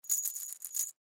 Nuts Bolts Shake Hand; Hardware; Machines; INDUSTRY; Blastwave

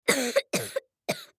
Human Cough; Cough Male Twelve Yrs Boy; Human Cough Male Twelve Year Old Boy; Sick; Sound Effects:Human

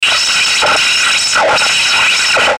static garbled; Static Garbled Squelch

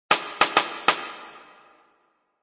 Rim Shot Loop 98bpm, Rim shot loop at 98bpm; Drum Loops

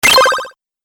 Arcade 8Bit Robot Fx, video game

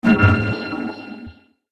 Space Impact Strike; Sci Fi Sound Effects

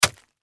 Flesh Stab Impact Hit Juicy Wet; Stab And Slice Sound Effects; Horror; Stabs and Slices; Blastwave

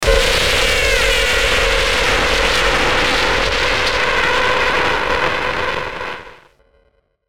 Hit Burst Distortion Warble Fizzle; Bursts; Production Element Imaging Element Accent Transition; Blastwave

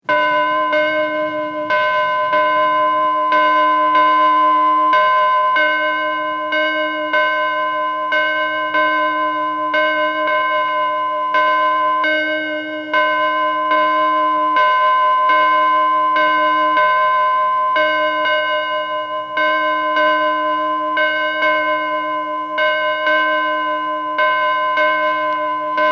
Church Bells Steady; Musical

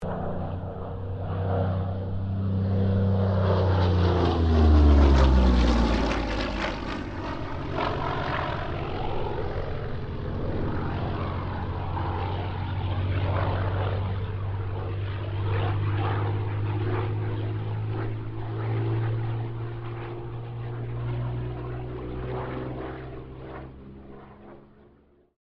AIRCRAFT P-40 KITTYHAWK PASS STEREO

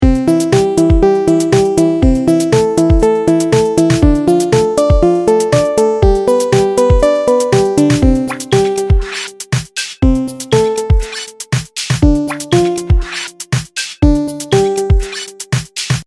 Seamless Music Loop Cartoon Edition; musical; Musical Sound Effects

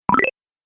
Pickup Points, video game sound effects

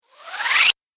Chirpy Whoosh; sound design

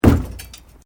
Metal Light Movement Minor; Materials

